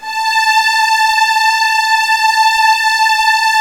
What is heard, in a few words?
Virtuoso Strings